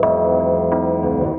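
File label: KEYS loop R, Drum & Bass 175 BPM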